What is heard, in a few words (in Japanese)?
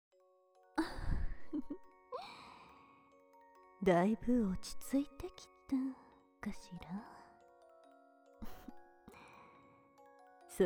ローションマットで両耳オホ吐息浴びせ; ローションマットで両耳オホ吐息浴びせ ペニス型バイブソードでママたちへ逆転攻撃のボーナスタイム 初来園ver; BGM有り